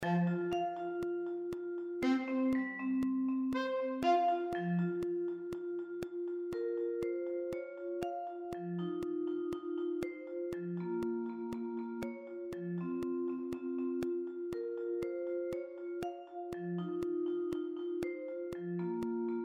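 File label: vibraphone, sax ensemble; MIDI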